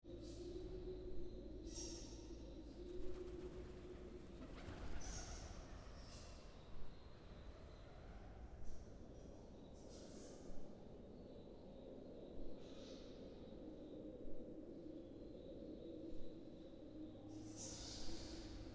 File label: cave bats pigeons; spoteffects